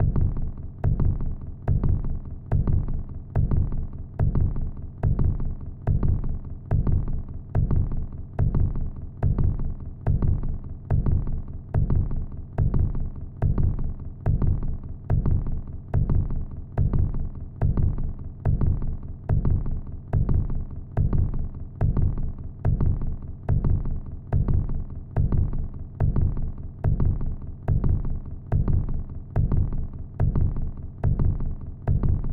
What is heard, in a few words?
heartbeat